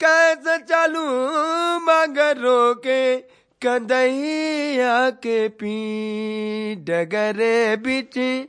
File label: male devotional, Indian Singing; VOCALS and SPOKEN WORD